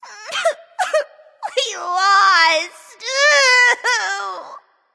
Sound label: boohoo; smite; artemis